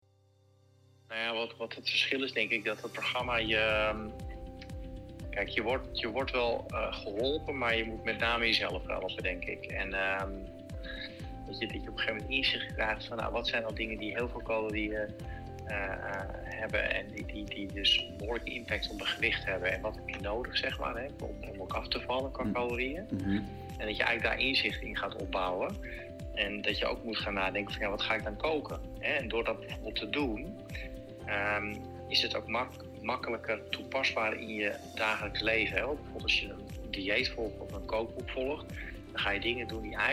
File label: uplifting corporate